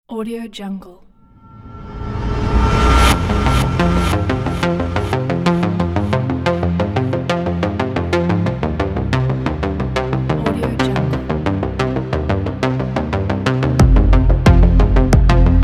80s synth wave pack; tinsrecords

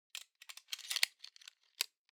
object plastic frame small mechanics rattle

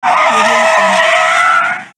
tire squeal

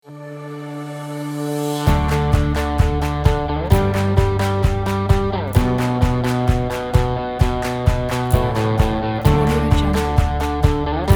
lite indie rock

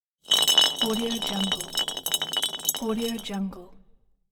empty wine bottle rolling; moonaudioproduction

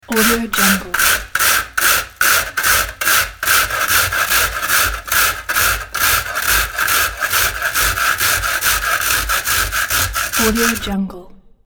grating sound

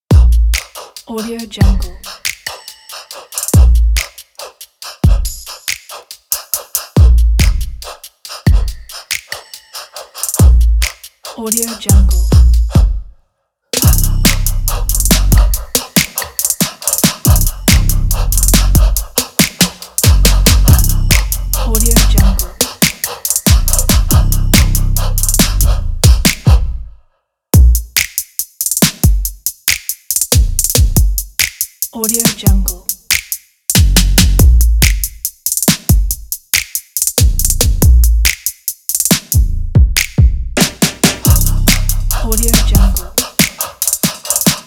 business

